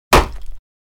Bullet Impact Dirt

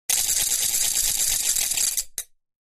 Mill Clack Wooden Rol, percussion shaker

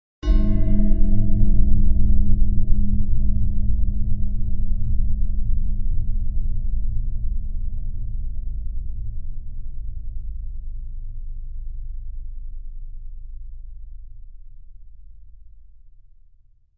Bell Chime Lfe Reverb

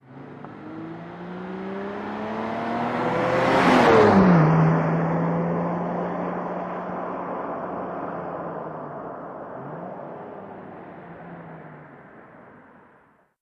Car Bys Fast Singles

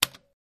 Computer Keypad, typing

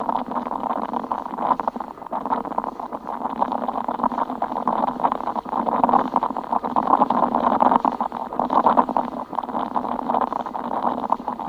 Coffee Percolates

